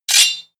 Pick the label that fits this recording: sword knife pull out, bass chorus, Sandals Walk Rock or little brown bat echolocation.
sword knife pull out